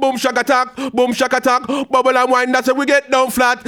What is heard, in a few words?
ragga in B phrase